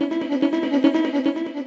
afro stutter, vocal or voxy loops

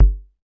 kick, drums single hits; iElectribe Kicks

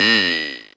speak n spell eeeey, CIRCUITBENT; Speak and Spell

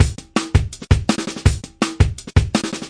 live echo snares, drum loops and breaks, 165 bpm

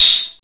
electro perc; Hip Hop; STYLE KITS (Hip Hop, Etc); Precise Electro Percussion

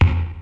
gated synth bd; Kicks; single hits

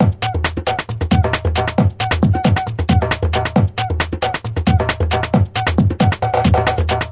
135 bpm funky brazil, drum loops and breaks

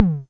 mattel tom, 8 BIT; LO FI; Mattel Synso

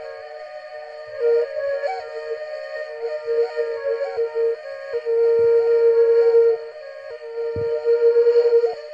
looping flute ambience

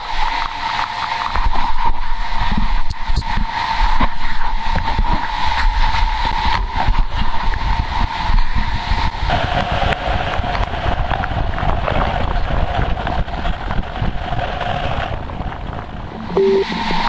fondling the mic abstract loop; LOOPING AMBIENCE

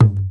talking drum lo; ETHNIC and WORLD PERCUSSION

